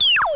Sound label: down